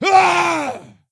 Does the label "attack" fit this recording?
Yes